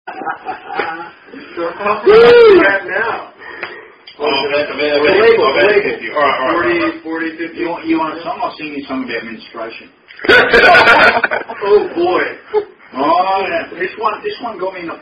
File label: Drinking Song; Menstration Drinking Song; The End Of The Month Rolls Along